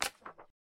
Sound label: Paper